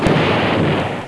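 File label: EXPLOSION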